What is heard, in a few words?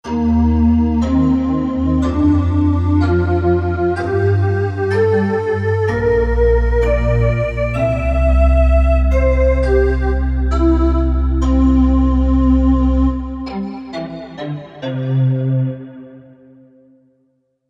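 Stereo Voice; Stero Voi3; Korg MSC-1S Variety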